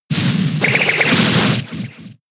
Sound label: Razor Leaf; Pokemon; gen 7; SUMO; USUM